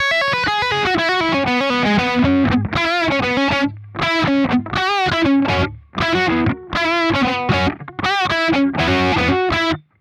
Cort MBM 1 Peavey

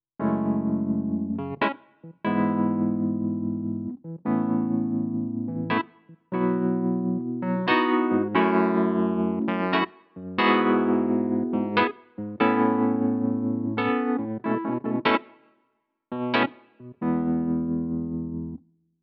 E Piano

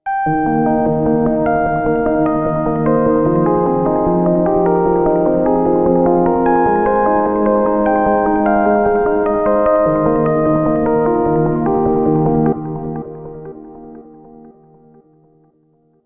Korg minilogue xd

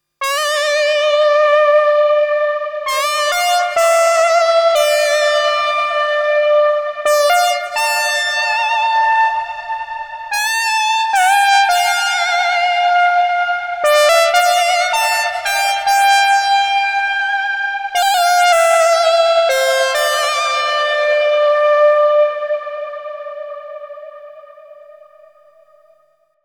Enhancia Neova Solo

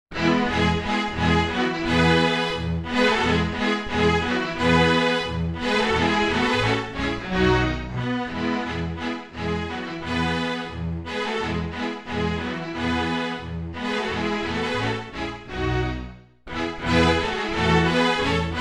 Emax factory; zd701 la primavera

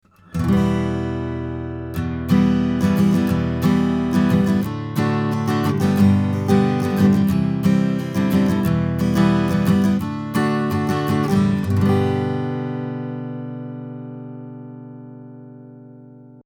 Acoustic Git OC818 preamp only

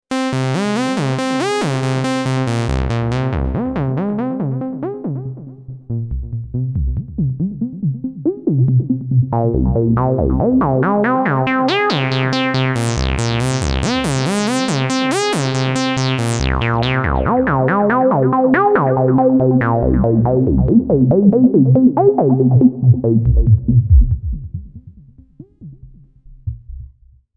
VCF 18dB Lowpass; Xenophone

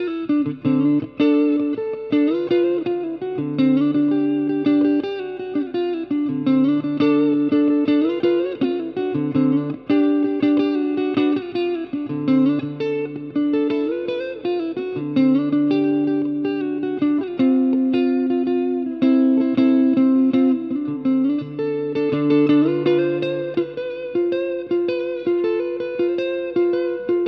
Supro 64 Reverb Clean more Reverb

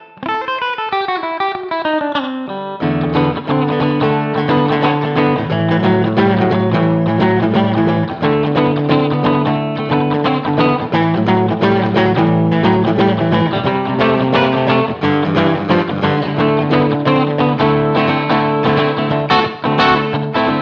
Bridge PU RocknRoll

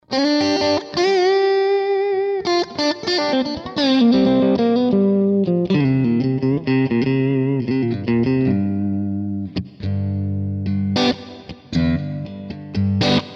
Neck Middle SC Crunch; Schecter Nick Johnston

